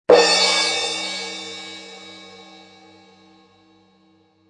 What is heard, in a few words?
Cartoon Drum Cymbal Crash, Classic fall or hit impact; Comedy/Cartoon; Funny Sounds